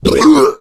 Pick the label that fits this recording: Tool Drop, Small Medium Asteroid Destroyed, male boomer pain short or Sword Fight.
male boomer pain short